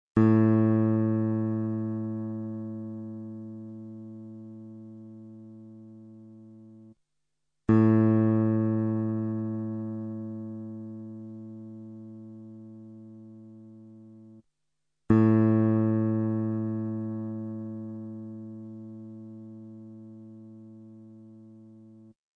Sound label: Tuning note A; 10 Rock & Blues Solos; De Haske